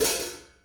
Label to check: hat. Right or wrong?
Right